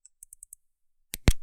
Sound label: Flash redeye